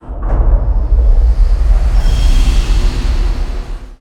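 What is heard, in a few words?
Silo Ambience Start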